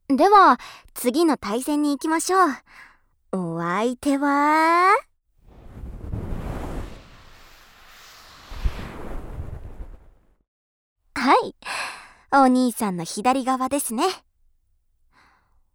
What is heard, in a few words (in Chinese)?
吹耳朵、左耳, ASMR; 附赠。后日谈